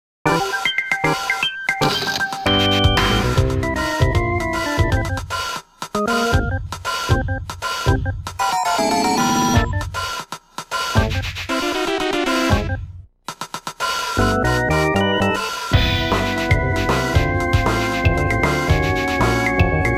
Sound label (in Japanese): 地下用BGM -Radio Tone-; All Target Cluster; 未使用曲